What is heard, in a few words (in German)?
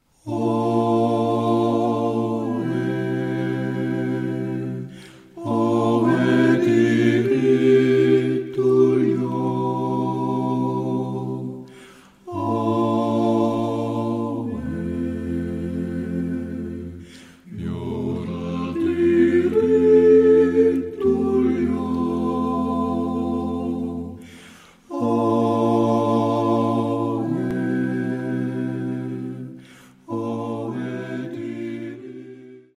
Aiblinger Weihnachtsweis